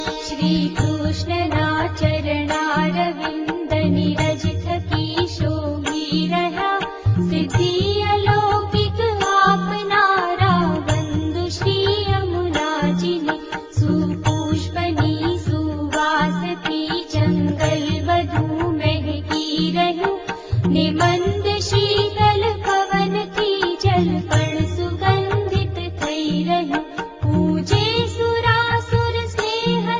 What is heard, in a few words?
gujarati